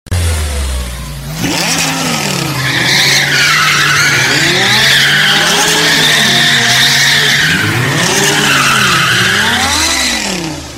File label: drift